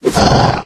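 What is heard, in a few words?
lizard dodge; yasher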